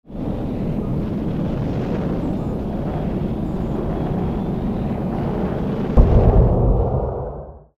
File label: Rocket launch